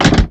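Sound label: Collision Interior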